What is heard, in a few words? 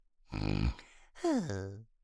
snoore; Racetrack Riches; megaboard